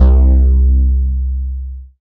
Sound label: Single Electric Basses, Dance Floor Bass; Best Service